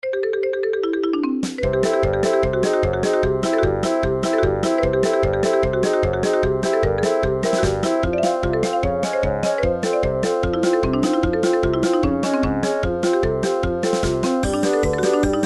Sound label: marimba lick remix; nintendo 64; banjo tooie; title screen